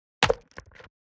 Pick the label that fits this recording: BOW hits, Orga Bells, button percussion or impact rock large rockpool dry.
BOW hits